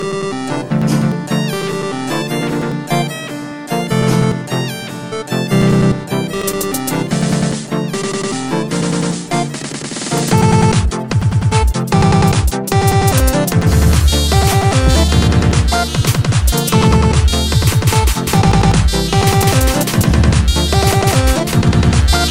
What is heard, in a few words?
Samsung Nouveau, sonnerie